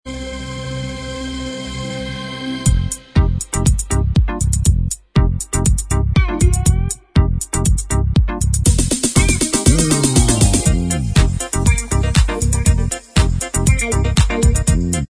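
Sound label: instrumental, track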